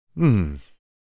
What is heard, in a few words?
Man thinking hmm